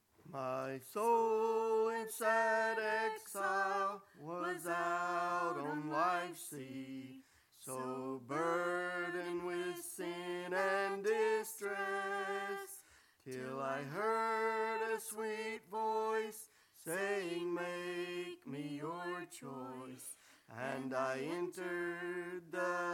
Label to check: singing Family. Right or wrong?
Right